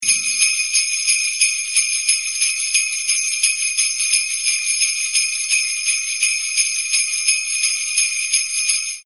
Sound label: Christmas Sleigh Bells Sounds Car Horn